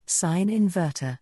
sine inverter

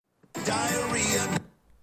diarrhea

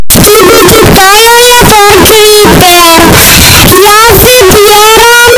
toilet; creeper; loudest ever

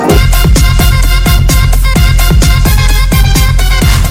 funk

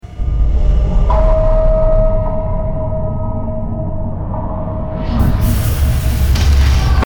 ominous

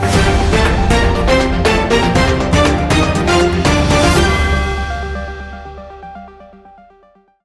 news outro

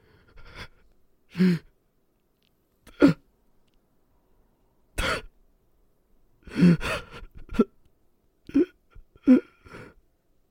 crying; mirage

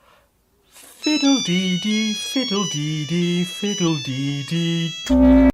fiddle dee dee fiddle dee dee fiddle dee dee doo

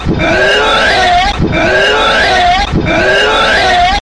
uwu uwu wu wu wu

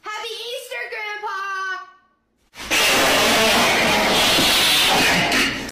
happy easter fart

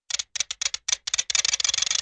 rapid ratchet clicks

